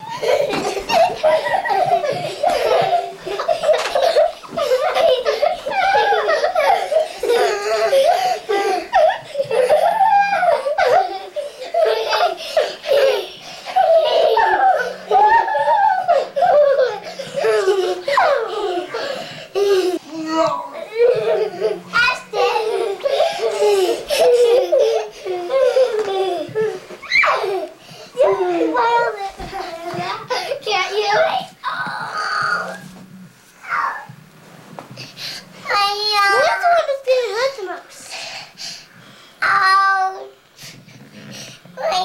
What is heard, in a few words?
Kids Play Horsie Fun